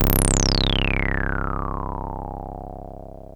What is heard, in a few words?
303 C1, TB 303